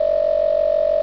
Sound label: Wakeup; Grand Central Pro